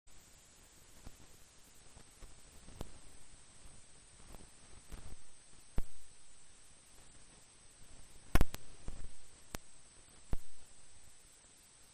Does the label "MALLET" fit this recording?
No